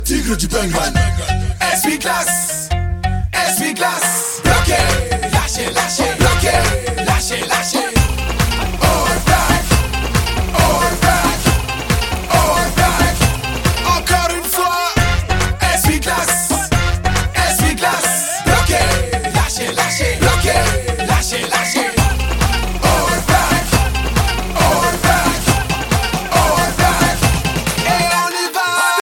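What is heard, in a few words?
english instrumental ringtone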